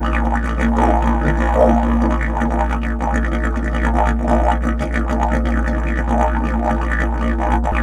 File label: BF BURBLE, Didgeridoo; Big Fish Audio - Didgeridoo and Other Primitive Instruments; BURBLDRONE